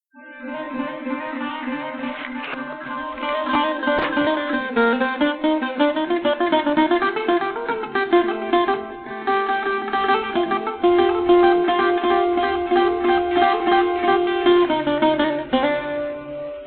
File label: Improvisation